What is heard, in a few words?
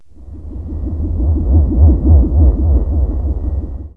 low throb, space strange; KABOOM! - Wild and Wacky Sound Software